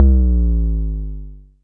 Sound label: Synthe Drum, Daytone Drum Synthe RDS